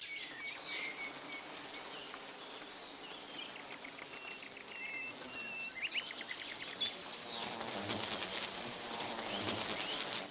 fliege kommt; botanicula

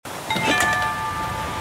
briefkasten; The Flame in the Flood

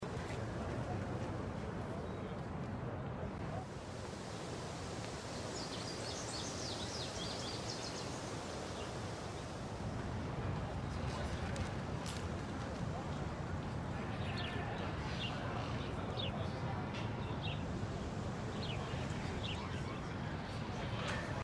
schulhof hintergrund; life is strange

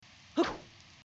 weapon schlag no enemy; Zelda - Breath of the Wild

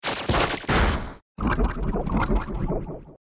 gifthieb; Pokemon Let's Go Pikachu; gamesoundopedia

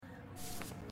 papier ablegen; life is strange

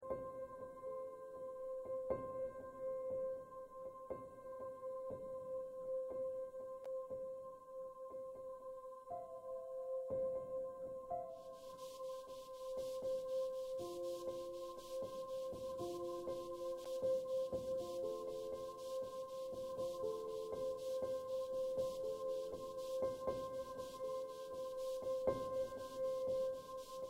angespannt music; Life is Strange 2; grandparents; gamesoundopedia

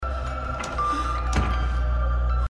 schrank; Never Ending Nightmares

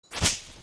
sichel; Stardew Valley

